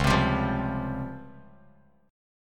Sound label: Db Major Sharp 11th Db Bass Standard; 9,15,17,10; strummed; chord shape; JGuitar